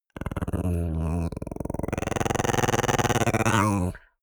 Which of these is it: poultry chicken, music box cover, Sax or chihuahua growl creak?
chihuahua growl creak